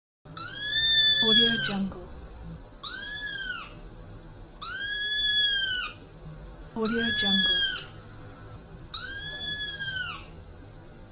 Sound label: little cat kitten